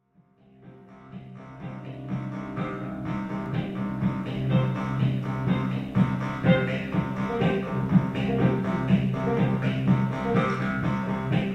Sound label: Jews Harp Guitar Techno